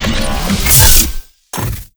atk, monster, steamer crazy

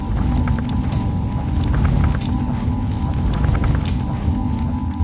stone idle, monster

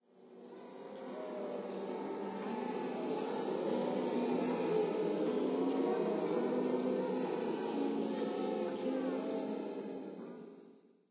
corridor, undeadtower

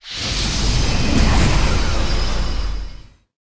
force tower attack, monster

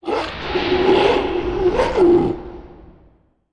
ghost chef death

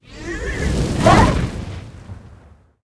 zombie grandma attack, monster

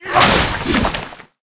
poison plant attack, monster

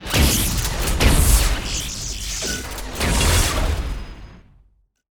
boss arm death, monster

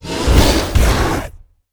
Boss Gorilla, monster

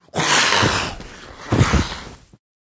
snake dead, monster

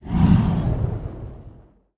Boss Mech Tyranno main

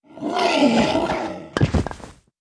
axe death, monster

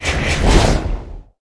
dark blader attack, monster

